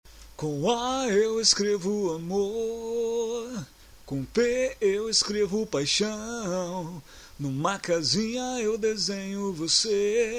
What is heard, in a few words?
funk